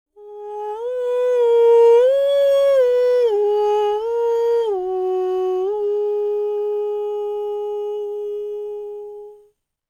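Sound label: falsetto, Vocal Planet Jazz FX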